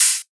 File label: OH; Hats and Cymbals